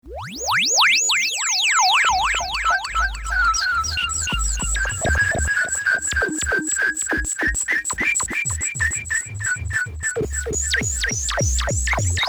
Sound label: Kaossilator